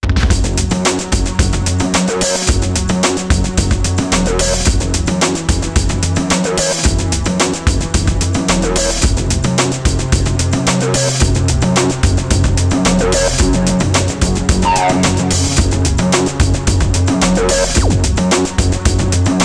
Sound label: 303 groove